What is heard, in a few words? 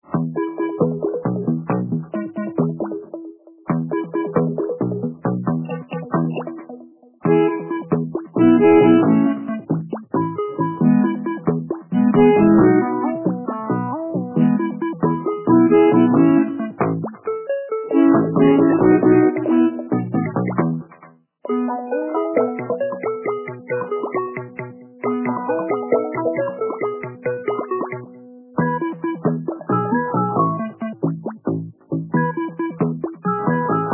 menu music